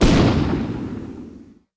Alien Death; Harvest Massive Encounter

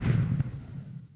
Alien Death Shrapnel; Harvest Massive Encounter

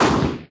Alien Tiny Death; Harvest Massive Encounter

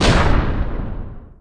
Eagle Explosion; Harvest Massive Encounter

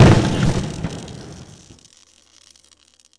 Tempest Hit; Harvest Massive Encounter

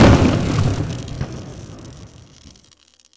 Tempest Hit; Harvest Massive Encounter

